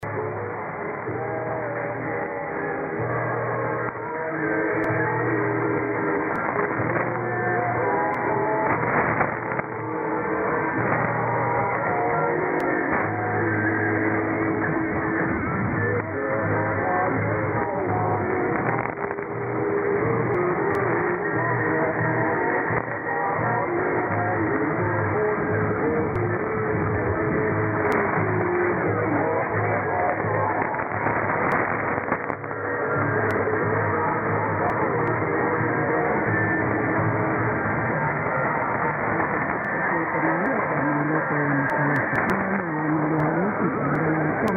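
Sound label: Tonga, radio; 1017 A3Z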